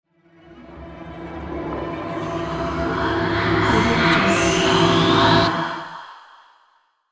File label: horror logo